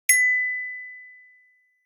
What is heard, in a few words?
Notification Ding; INOI 2-Lite-2019; notifications